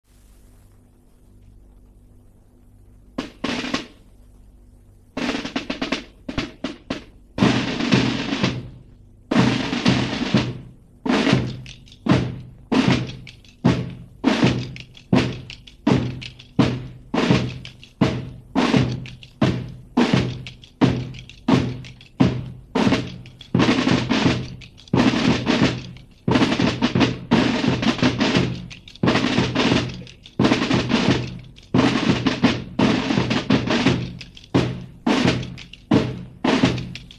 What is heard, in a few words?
Drumsticks Drums; Platoon